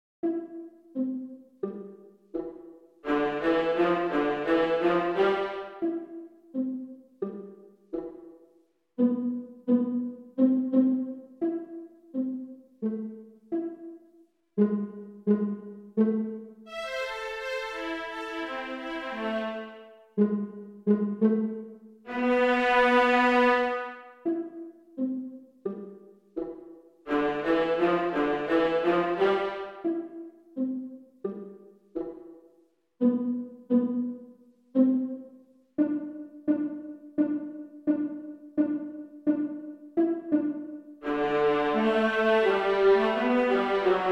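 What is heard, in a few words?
Pony Trot Viola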